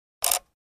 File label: Button Beep; buttons